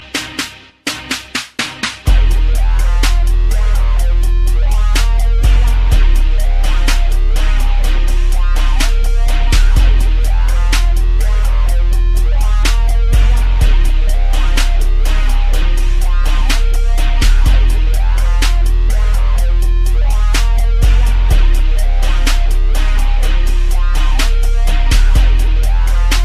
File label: hard basss; hip hop